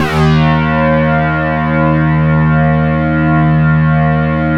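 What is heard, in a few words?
OB8 full blow EB, OBERHEIM OB8; Big Fish Audio; First Call Vintage Keyboards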